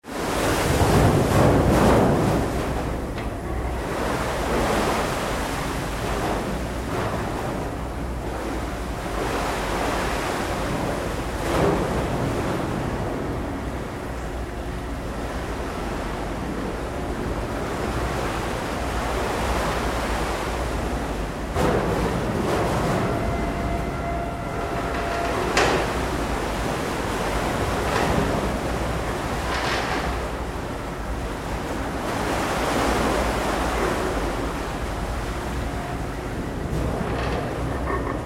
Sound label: Ship Oil Pipeline Service Vessel Sea in Moon pool; Tom Hutchings